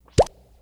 stamp pon; anemoi

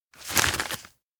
map takeout; awayuki; anemoi パッケージ版

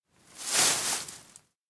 something move forest; anemoi

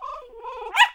dog cry; anemoi

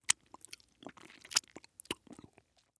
animal lick; anemoi

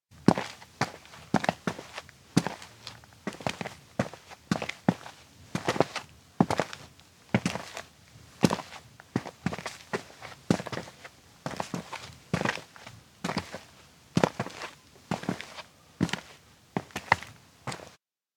steps outdoor town schoolwalk; rikka; anemoi

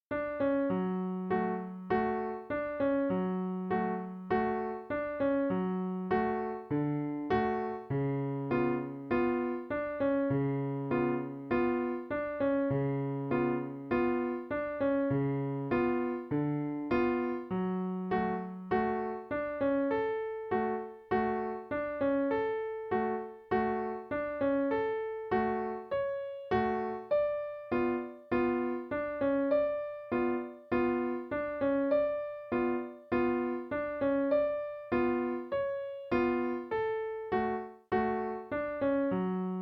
piano cat march loop; anemoi

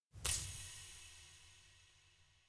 hands touch through crystal; aino

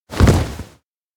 knees drop; rikka; anemoi パッケージ版

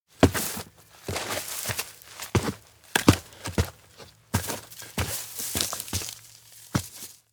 steps outdoor tree climb; ken; anemoi

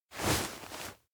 arm wrap tense; aino

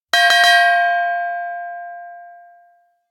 start gongs; anemoi

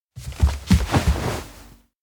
rush bed; minato; anemoi

